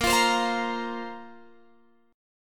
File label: A sharp Suspended 2nd down Guitar Open F; A#sus2 strummed